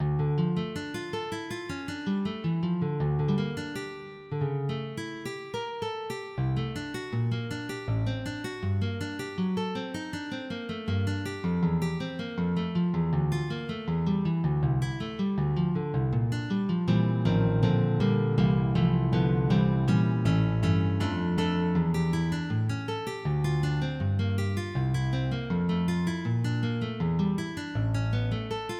Fantasia Ré mineur, MIDI

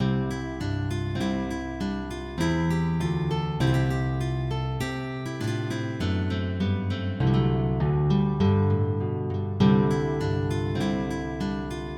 Gavotte

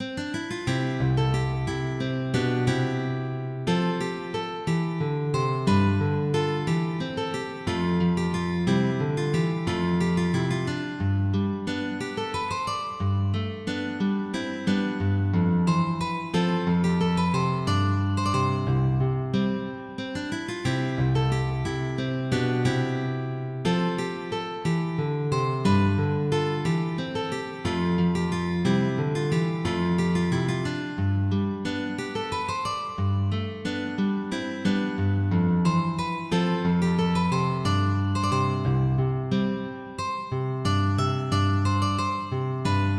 Courante, MIDI

